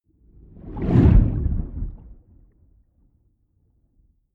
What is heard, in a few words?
whoosh abstract low